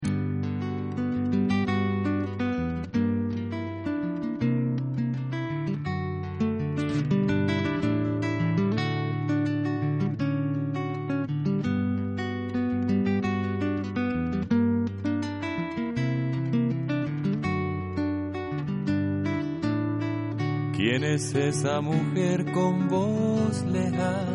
alto

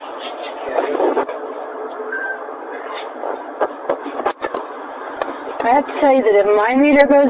more giggling

